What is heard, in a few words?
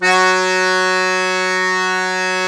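DIATO F#3, diatonic accordion, Historical Instruments; AKAI; USB Soundscan vol.23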